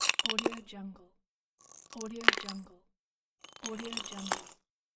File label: ice particle slide and collide; lokohighman